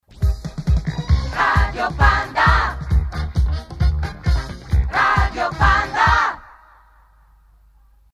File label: jingle radio; panda